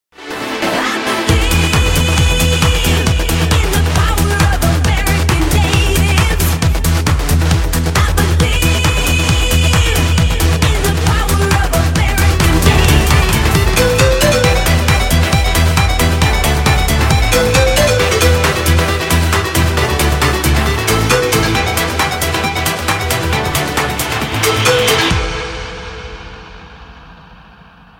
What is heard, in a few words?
american natives ringtone